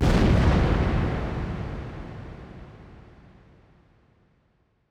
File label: bassdrum reverb FX kick; Vengeance Samples